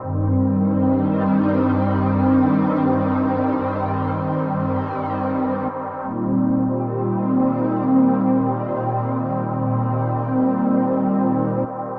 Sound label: SYNTH PAD AMBNT 160 C; Ambient; Sphere